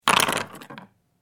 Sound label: dice